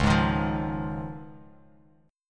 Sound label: Db Major Add 9th, Bass Standard; strummed; JGuitar